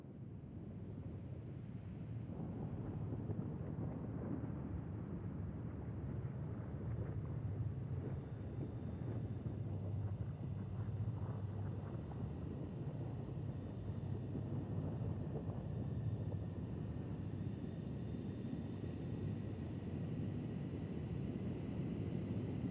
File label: Caves Ambience; gonner linux macos ps4 switch windows xbox one gamerip 2016